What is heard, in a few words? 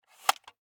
Viewmaster, plastic, lever, load; Eiravaein Works; Vaeyan IV